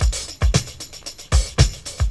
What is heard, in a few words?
break, breakbeats